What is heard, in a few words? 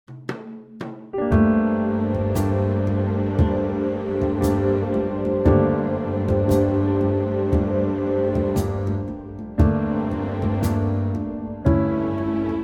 Gm orch; key - Gm; Stunning arrangement of this classic standard; subtle movement in the orchestral cushion